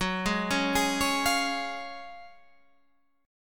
Gb Major 7th Suspended 2nd, down, Guitar, Half Step Down